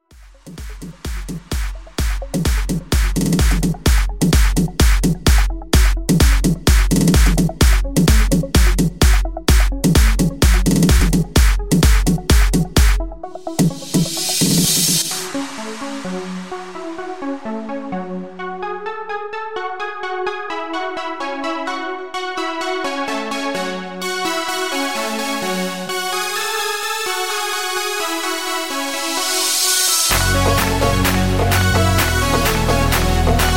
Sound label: Festival EDM 128 Bpm Clean